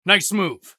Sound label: usec cheer; eft